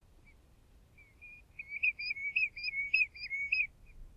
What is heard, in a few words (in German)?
goldregenpfeifer